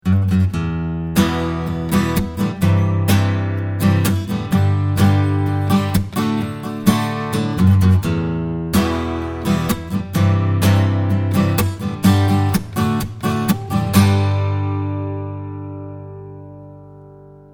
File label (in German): Mikrofon Schallloch Basslastig